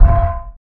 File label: Tube Kick; FREE SFX PACK VOL 3